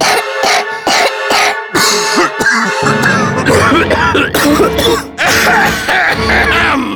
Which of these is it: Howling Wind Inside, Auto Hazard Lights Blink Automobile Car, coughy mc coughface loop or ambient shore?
coughy mc coughface loop